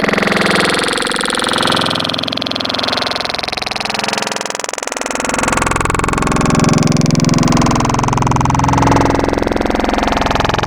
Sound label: Growl Tables; Skinny; Cymatics; Growl Tables Vol 3; Serum